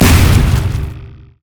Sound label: wildfire; LoL; brand zombie